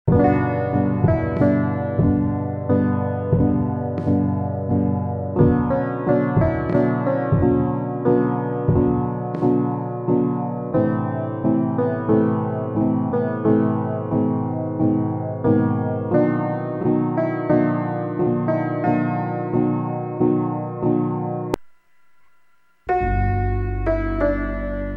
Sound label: piano